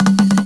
BONGO

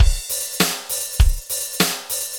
ROCKS, ROCK POP DRUM; MAGIX

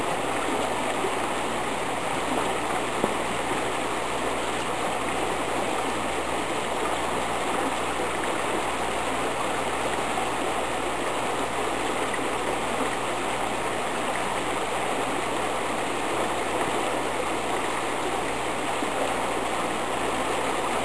STREAM

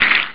Splotch; Gladiator Duel

